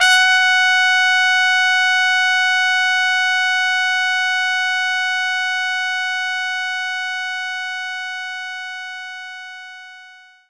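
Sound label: Trumpet F sharp 6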